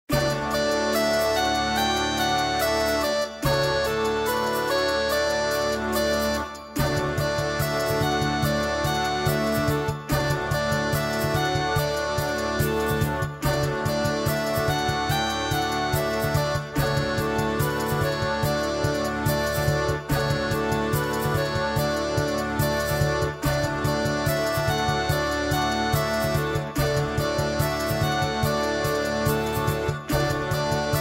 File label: Flute and Drummer nur Begleitung